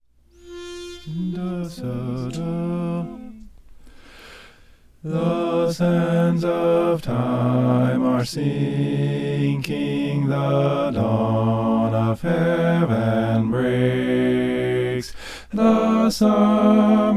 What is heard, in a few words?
the sands of time bass